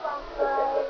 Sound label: flashlight